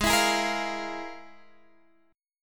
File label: F Minor Major 11th, G sharp